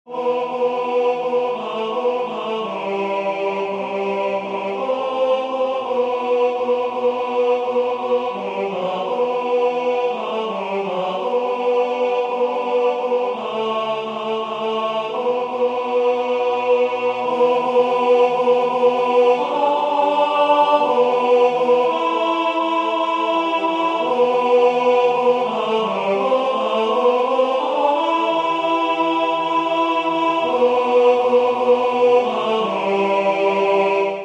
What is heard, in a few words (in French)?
Vous êtes dans mon âme tenor